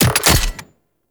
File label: Concrete Archgun Charged Mech; Warframe; Archwing